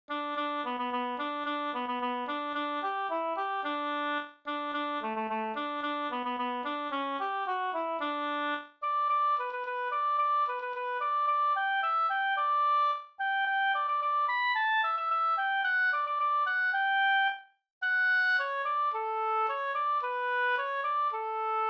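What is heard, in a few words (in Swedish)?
folkdans Sønderborgskadrilj G dur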